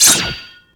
slash; bobby

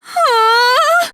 hooonh; komaru

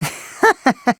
laugh

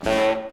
band hit

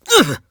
pain; sonic

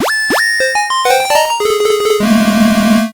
deceived; dr2

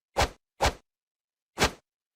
shimmy

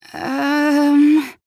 uuum; toko; drae

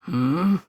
hmm; sakura

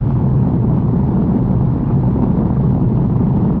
earthquake

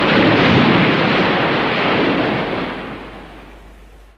waves; runescape; ena

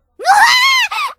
shriek

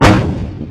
deskslam heavy; gyakuten touhou

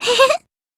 laugh

